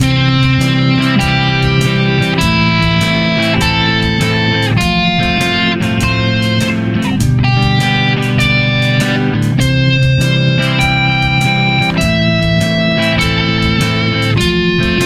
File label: roots and 5ths